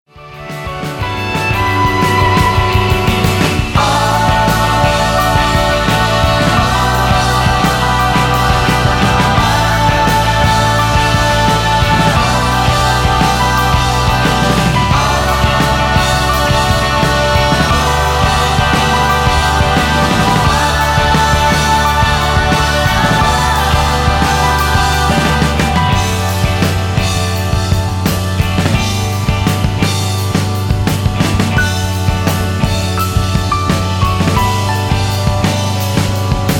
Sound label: Drums